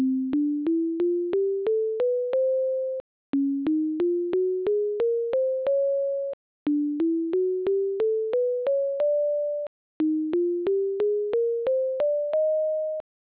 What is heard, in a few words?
Four Scales